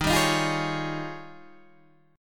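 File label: E flat 11th E flat, down, Guitar, DADGAD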